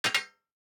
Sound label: DICE on Metal, Drop, Standard, 2 Two Dice; Samples; ArticulatedSounds; DICE Sfx Party (Extended)